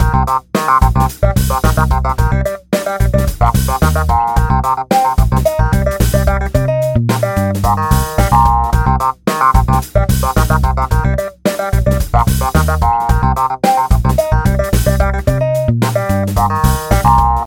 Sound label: 70s Funky Tone